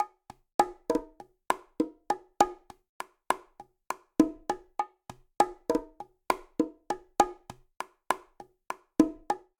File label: Bossa Nova Bongo 100bpm, Latin Percussion; Ableton; Factory Packs